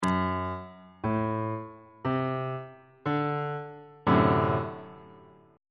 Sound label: d dominant 7th 1st inversion; bass clef